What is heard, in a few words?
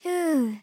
Panting; Teto; SM64 CO-OP; Triple Baka Pack